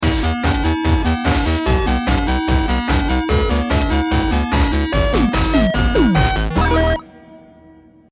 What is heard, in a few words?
won round